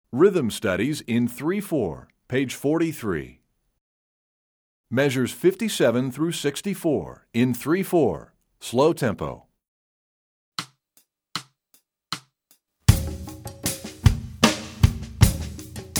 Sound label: Slow Three Four, Percussion; EE2000 Book1